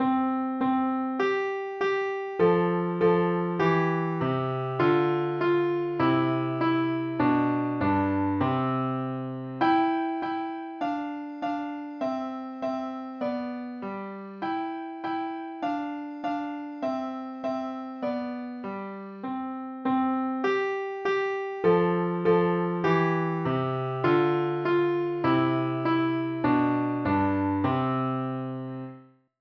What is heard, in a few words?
twinkle